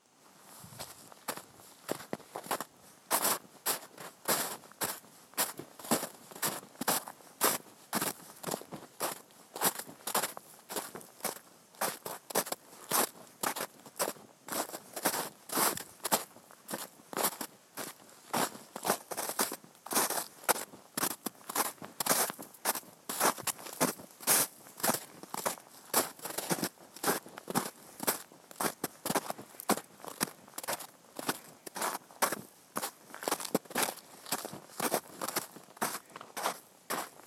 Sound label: Walking Through Dirt And Snow On Side Of Country Road